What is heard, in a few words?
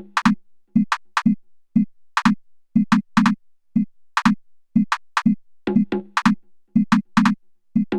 perc 120